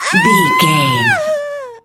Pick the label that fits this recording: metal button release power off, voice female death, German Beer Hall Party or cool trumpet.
voice female death